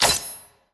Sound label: ventricle razor